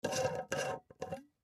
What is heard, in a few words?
Jar Untwist Lid Remove Glass; Food Sound Effects; Household; Foley; Blastwave